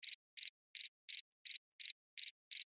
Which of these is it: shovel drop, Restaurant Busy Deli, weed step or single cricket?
single cricket